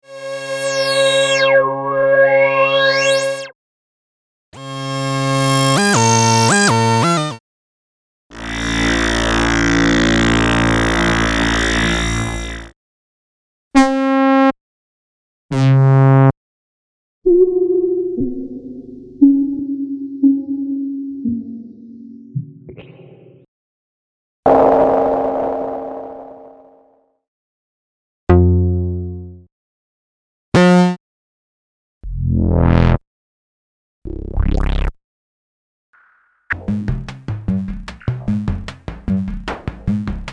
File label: Moog Modular